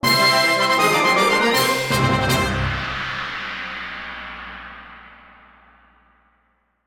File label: Boss Clear Fanfare; Shadow Links